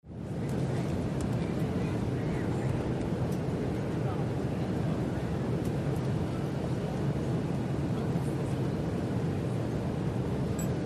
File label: Boeing 747 jumbo jet, interior, in - flight, announcements before landing